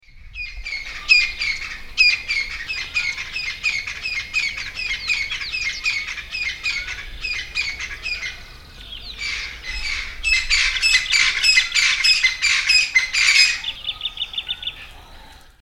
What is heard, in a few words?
Crested francolin calling